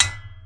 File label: found sound forkoophone; UNUSUAL KITS and FX; Werkstatt Found Sound Kit